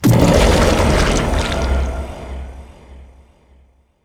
Ravager Death

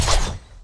Knife Throw

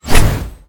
Swing

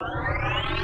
Nanogun Plasma Charge; Anomalys Nanogun

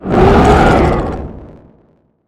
Hive Mind Roar

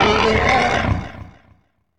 Roar

